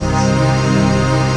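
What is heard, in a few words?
chord pad, Pads; Best Service; XX Large - Pads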